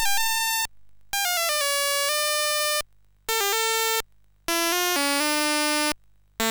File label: sid MOS6581R3, GAMES; Antics